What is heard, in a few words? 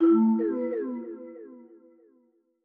Marimba; Special-Photo Impossible-Triangle